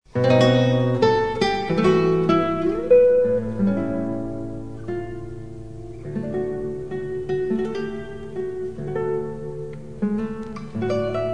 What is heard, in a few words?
tango; ALBENIZ; Iberia; La suite pour piano Iberia (1906-1909)